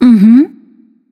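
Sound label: nonverbal agree; smite; voicepack; izanami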